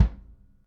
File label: Kick Low Vol